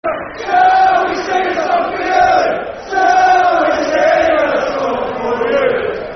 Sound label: Shall We Sing A Song For You, Football Chant (Soccer Song); Brighton Bhafc